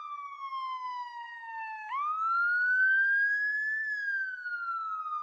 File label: Amb Red-Corridor Siren Loop